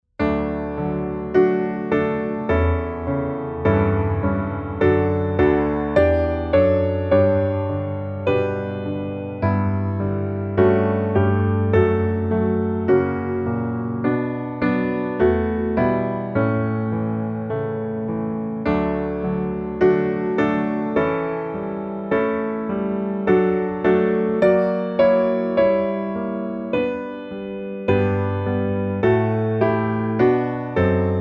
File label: piano